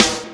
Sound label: snare 1; virtual drum sounds